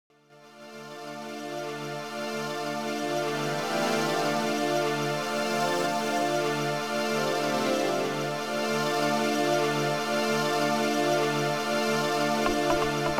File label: snap guitar